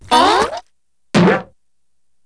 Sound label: Cartoonish Sound; PC; MARIOTEACHESTYPING2